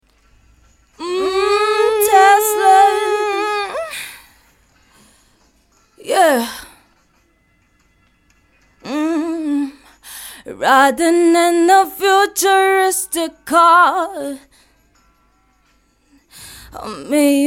pop R B female sing 83 Am

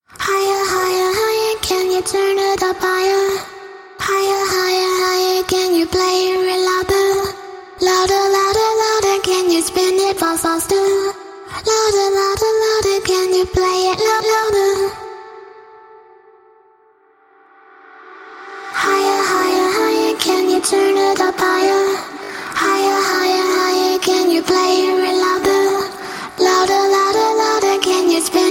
dance 126 G, Acapellas

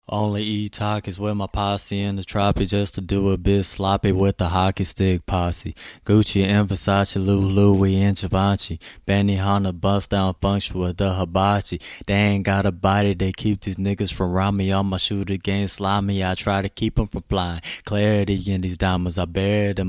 trap 80 G, Acapellas

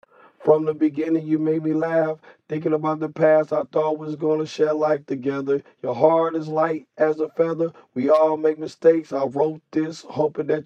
boom rap, 112, G sharp, Acapellas

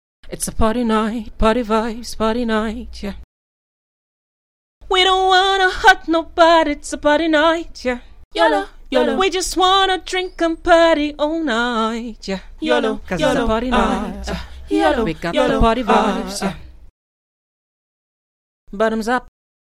Party Vibe hh pop female rap sing 115 Am

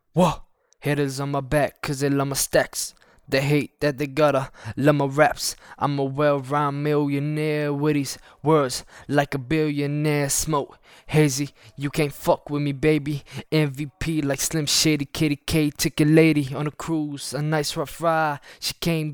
BPM108 Key Em

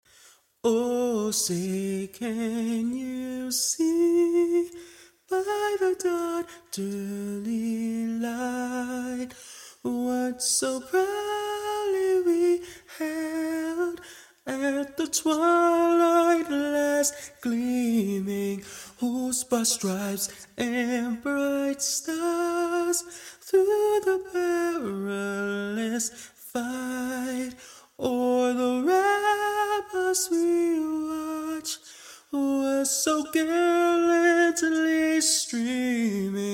acoustic, 80, Fm, Acapellas

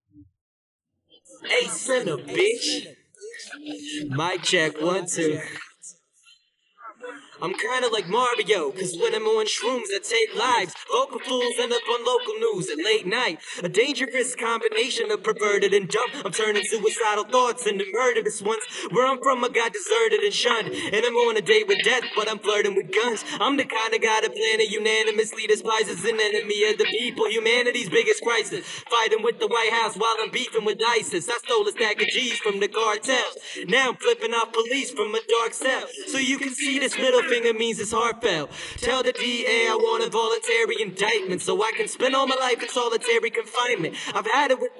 92BPM Key Bm

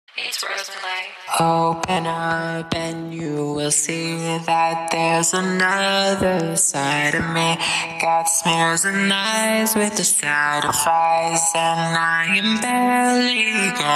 91 BPM Key Dm

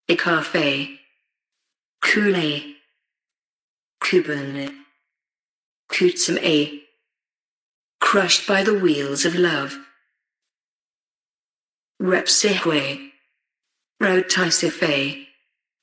electronic, 120, Gm, Acapellas

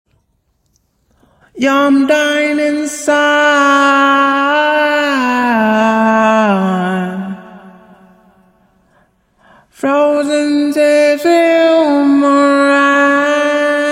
ambient 124 D, Acapellas

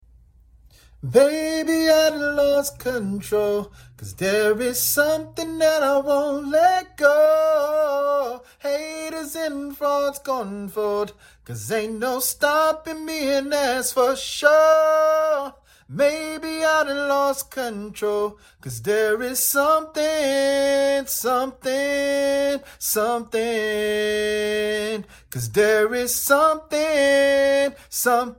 rnb soul 128 Em, Acapellas

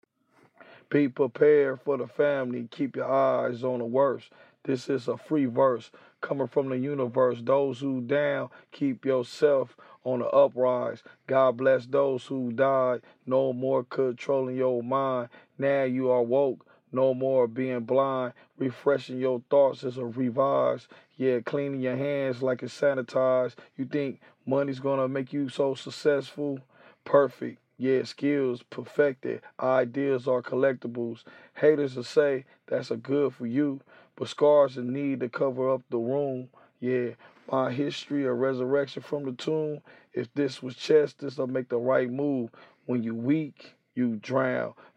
hip hop, 93, Bm, acapellas

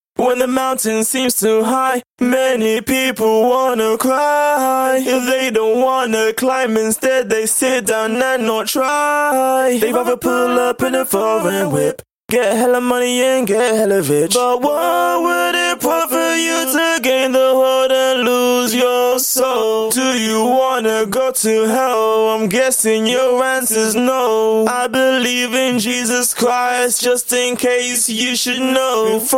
hip hop 102 Am, Acapellas

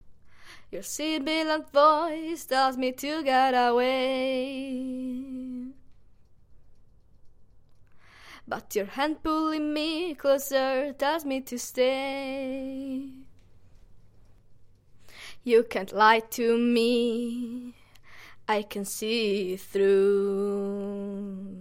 Pop 60 Cm

